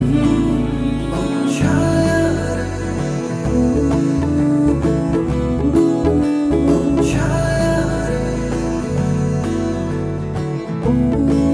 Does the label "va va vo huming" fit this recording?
Yes